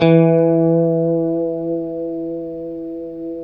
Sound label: E JC120; Spectrasonics; Hans Zimmer Guitars VOL-1; JC-STRAT MF